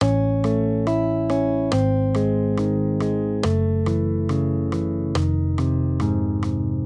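Accompaniment, Major Triads, A-Ab, 140 BPM, guitar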